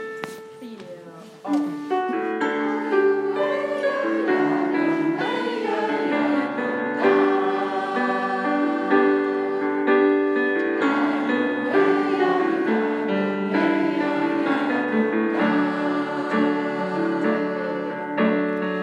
melodi

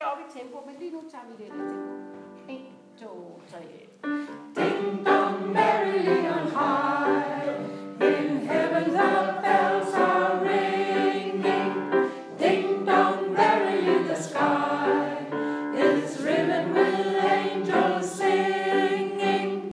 ding dong vers sopran og tenor

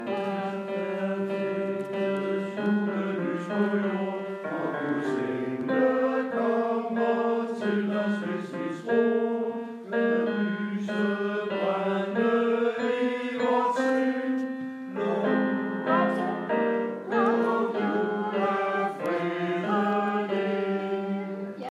luk julefreden ind tenor

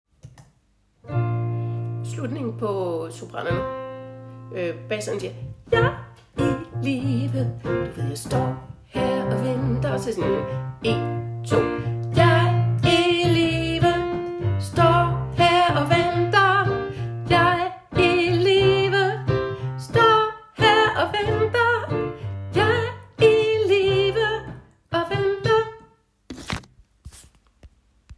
jeg i live sopran rigtig outro

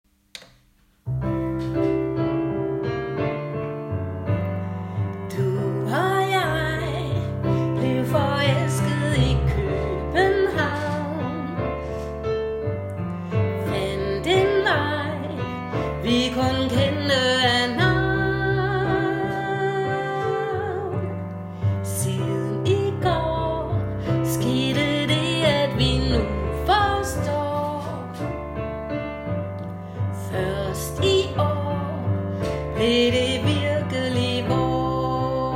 melodi

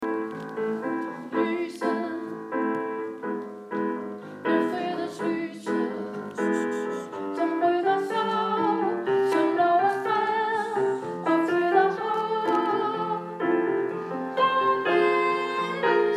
glæder sopran

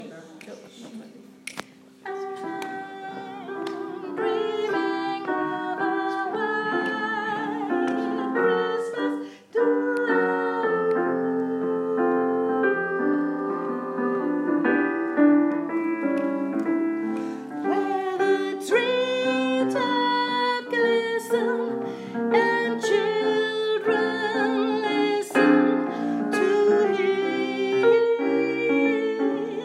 overstemme

